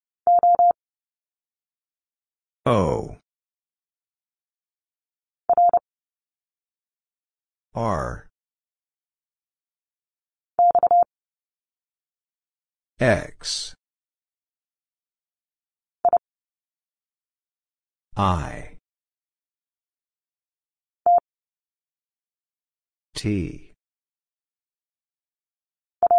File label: Letter q Single Character 30wpm; Lesson 38c